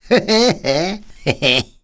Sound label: laugh; Cars